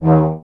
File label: tuba; Miroslav Vitous - Solo Instruments VOL-2